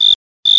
crickets; Amiga; odyssey

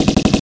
rapid; PCGAMER Disc 2.8 SEPT 1996; SHELSHOK

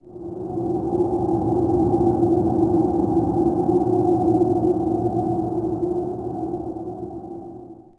wind; DAoC

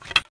BOW BEGIN; thief

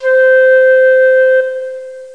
Flute; Castles - Siege and Conquest; Castles Demo

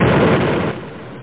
big explo

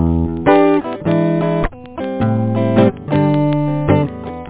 jingle; netscape

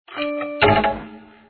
ding bleep; Together in Jesus - First Reconciliation - Computer Fun

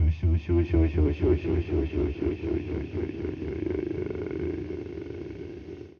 VOICEFX ETHNO 160

